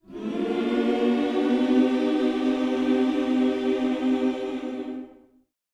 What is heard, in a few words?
MM MINOR, Gospel; Spectrasonics; Vocal Planet; MIN. SCOOPS